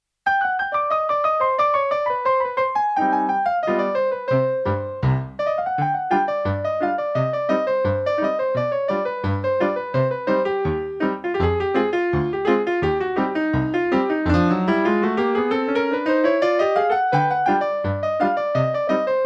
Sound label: Ragtime